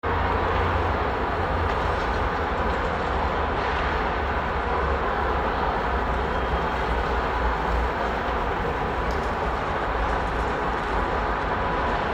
Europe Spain City Train Station Distant Forigen Voices Walla Ticket Gates